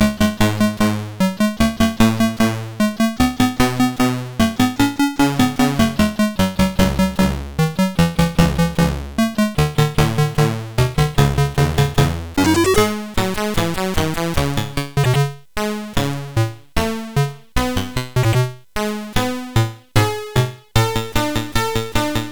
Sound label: Black Music, sid CSG8580R5